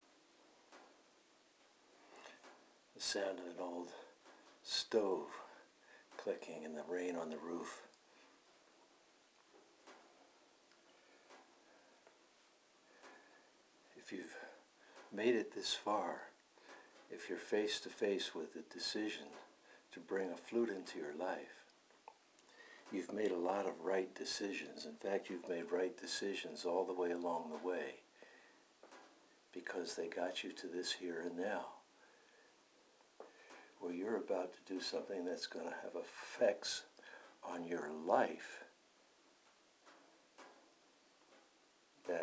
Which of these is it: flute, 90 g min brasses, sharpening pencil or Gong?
flute